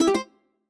camera on

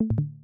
stream user left; backspace

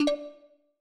user join

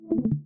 stream ended; backspace

